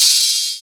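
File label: R&B open hi hat long; Hi Hats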